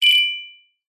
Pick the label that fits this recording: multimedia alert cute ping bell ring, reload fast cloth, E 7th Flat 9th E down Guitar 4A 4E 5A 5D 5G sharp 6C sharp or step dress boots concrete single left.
multimedia alert cute ping bell ring